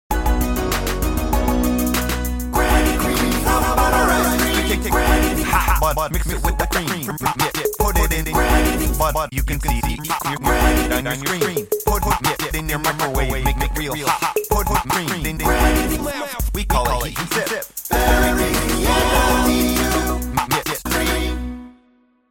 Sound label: ice cream evil; cave